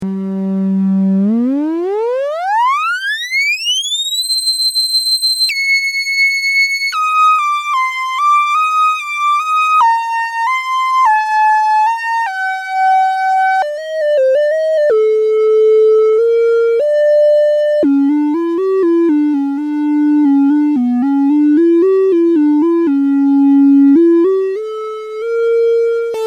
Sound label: unison